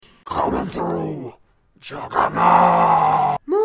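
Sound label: jaeger voice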